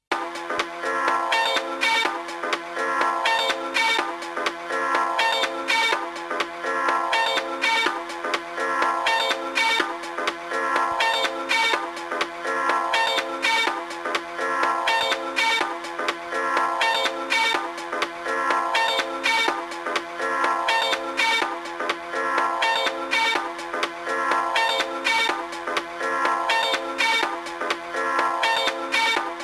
music

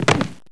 bodyfall

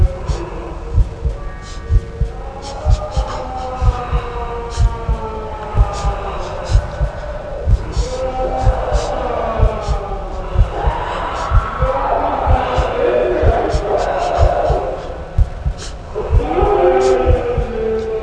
screams

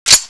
colt slide

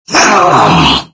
pain, paralyzed subject

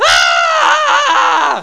wail

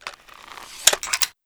xbow pull in, crossbow

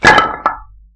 drop planks

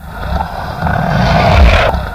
hunter angry; hl2

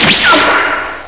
dash; dragonball

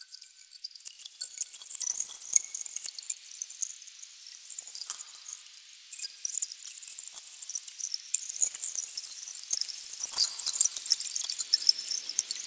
crystal sounds; ragemap2024; grunt

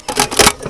mg42 open

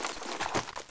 displacer draw, weapons

